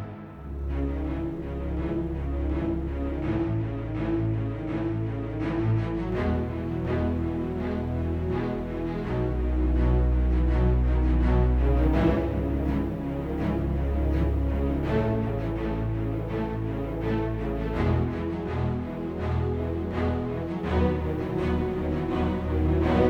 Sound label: Music Combat Vessel; Dungeon Punks; ps vita ps4 windows xbox one